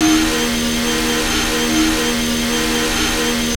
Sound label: in CMED, F1; Italy; AOS F1 2022